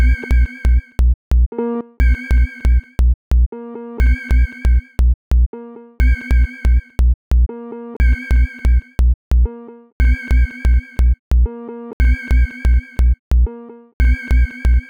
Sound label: Sine Wave